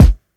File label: sharp kick one shot g key, steel kick drum sample tuned to the G note